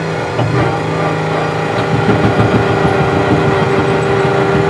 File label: turret, tanks; World of Tanks